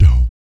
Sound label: PERC, Vocal, Jazz FX, BASS PERC